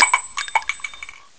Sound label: shell drop, sawed off